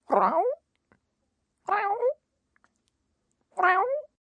Cat Curious, Cat, Animal, meowing, affectionate (human voice)